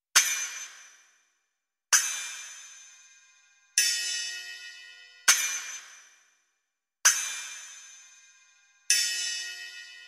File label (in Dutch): Het geluid van de Bekkens; Muziekreis